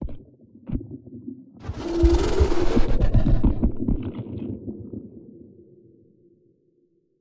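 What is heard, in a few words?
space dragon idle loop; Stellaris; reanimated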